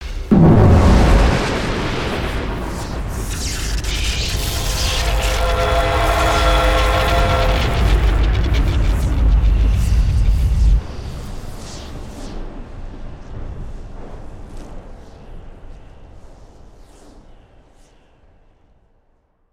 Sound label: dark reveal maelstrom tunnel